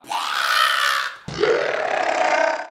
scream

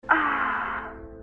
woman moan; ringtones; sms

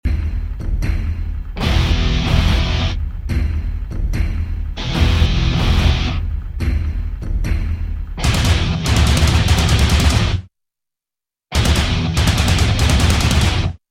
super bass tone

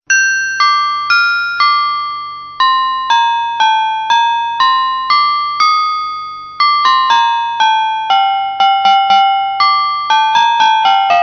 piano; holiday

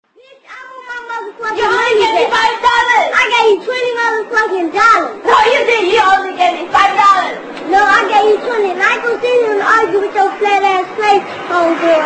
chinese tone

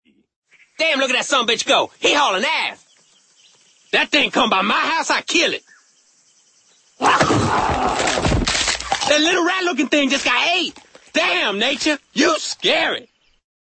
nature

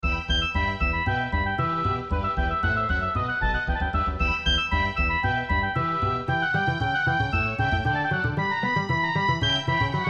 nokia badinerie; classical

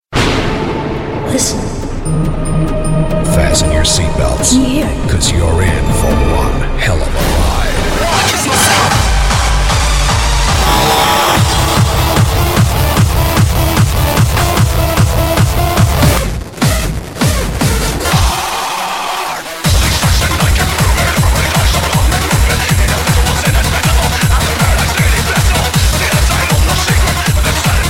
cool tune

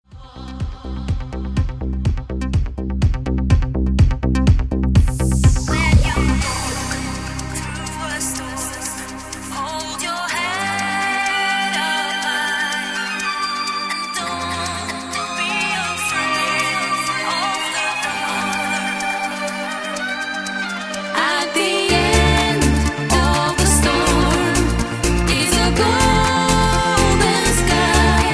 trance; electronic

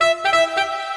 saxo notif

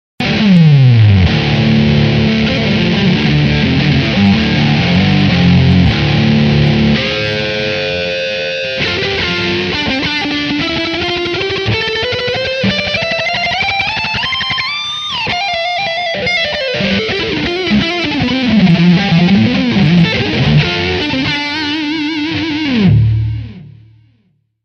guitar fast solo, rock; ringtones

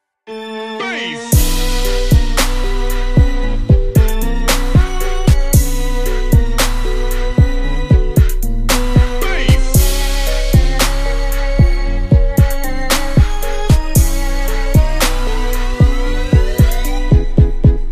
Trap; Mii Channel

